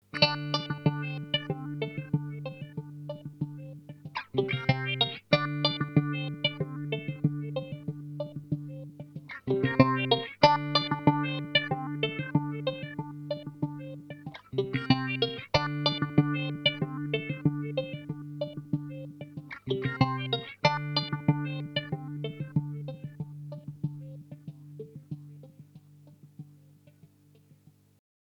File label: Boss SL-2 Ping Pong Routing